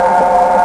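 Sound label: eng howl loop, ships engine; Quantum Redshift; darius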